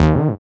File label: SYN DANCE; Sound & Vision - Gigapack II